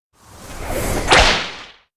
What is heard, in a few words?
attack; hero sound